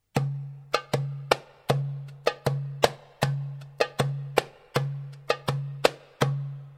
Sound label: darbuka